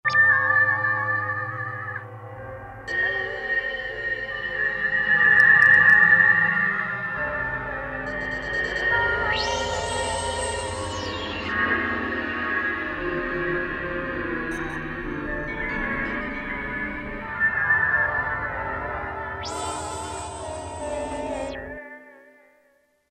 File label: Hell House; Roland M-DC1